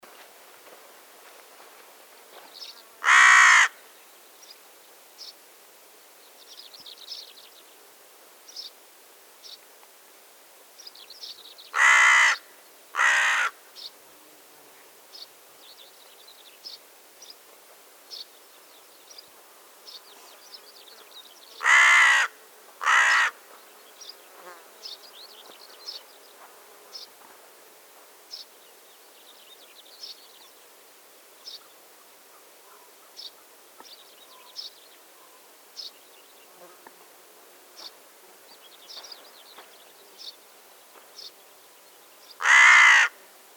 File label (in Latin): Corvus corone; SETE